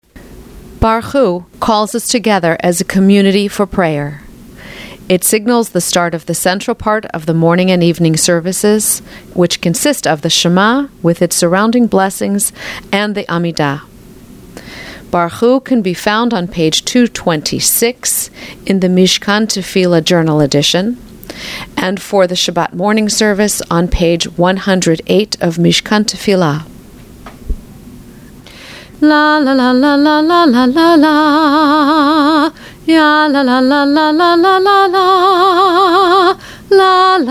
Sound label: Barchu